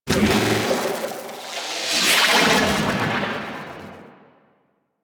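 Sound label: transference glitch; Warframe; The New War; Quests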